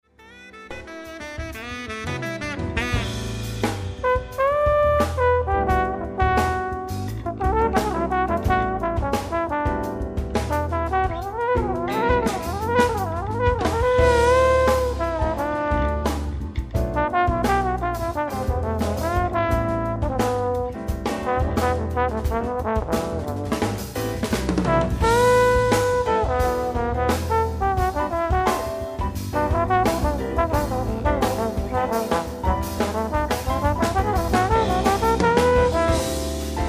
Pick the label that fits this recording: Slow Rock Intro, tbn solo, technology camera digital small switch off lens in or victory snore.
tbn solo